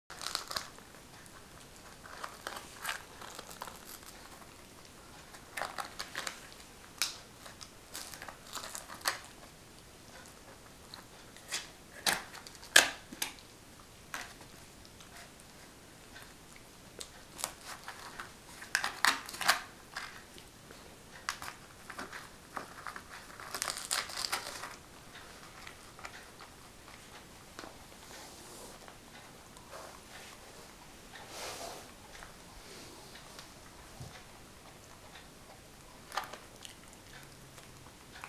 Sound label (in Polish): Dźwięk Jak Pies Je ''Świńskie Ucho''